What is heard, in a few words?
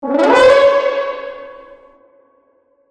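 Sting Medium Brass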